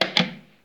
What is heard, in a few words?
button press; Apotheorasis